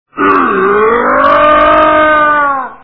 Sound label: eugh